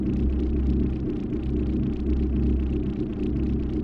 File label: Cars Hover Bus; Megacity